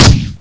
fire Pulse

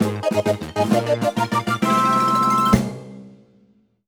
Fanfare; super mario maker 2

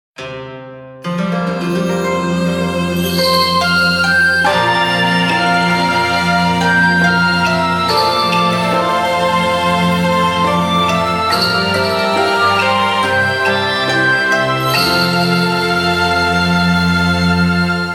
Tinkle; Touken Ranbu; android

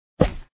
sound footsteps; monster arena

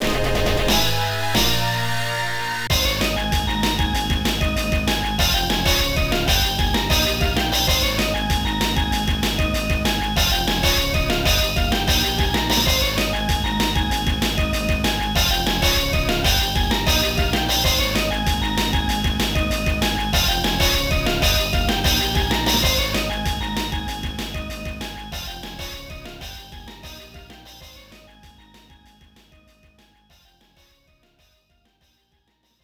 Goal; Super Sidekicks; Neo Geo arcade

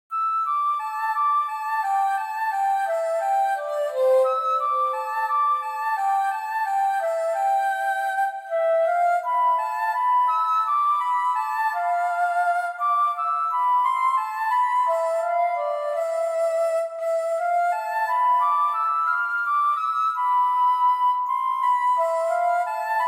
Bamboo Flute; god wars future past soundtrack

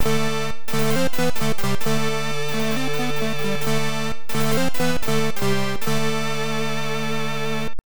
Jingle, Fanfare; RPG Tsukuru Dante98 II; PC-98

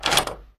door; misao

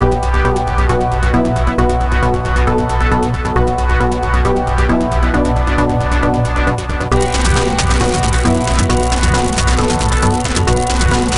Alien Battle; Lego Battles 2009 NDS

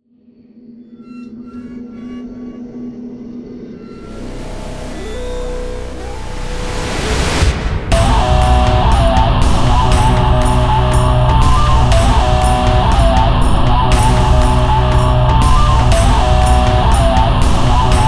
Dramatic Trailer; Air Guardians; Windows

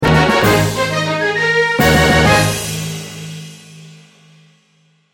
Jingle Fantasy

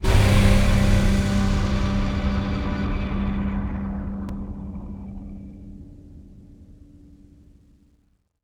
Reveal Sting; Rhonda

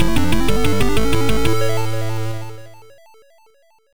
Level Complete; Turrican 2; Atari ST

